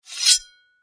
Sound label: Knife Scrape Blade, Metal; Warfare; Knives (Sound Effects:Combat); BlastWave